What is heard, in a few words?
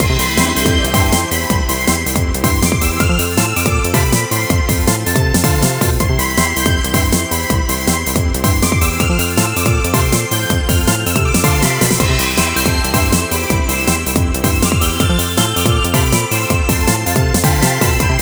bgm puzzle